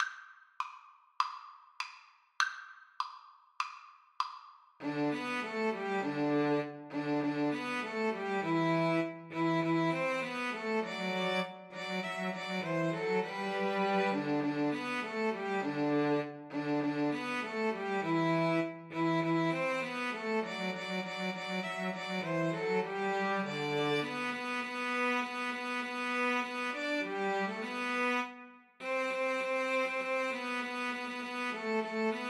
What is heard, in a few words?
jingle bells, Piano Trio